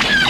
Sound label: LAZERM; Best Service; Advanced Mega Effects